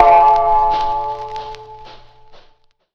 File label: dong